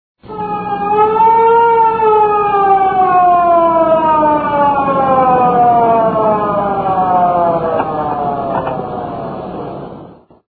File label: siren